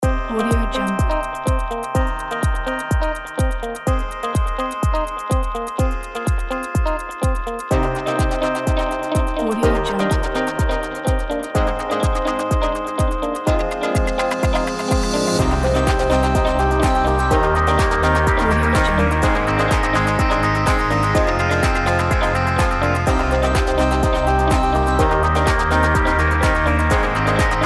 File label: tech loop